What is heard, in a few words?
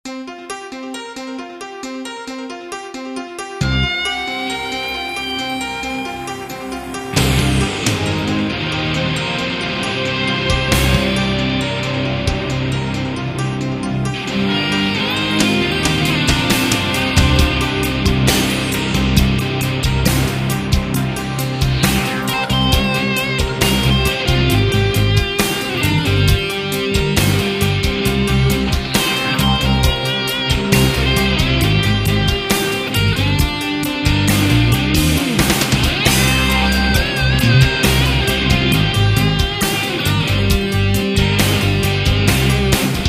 Rhythm; Rock electro jazz